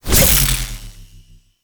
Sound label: Fishing Spear Throw; Weapons; Venus Fishing Spears; Warframe